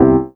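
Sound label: RHODS -R, Rhodes, Drum & Bass, 160 BPM; Ueberschall; Drum & Bass Resonance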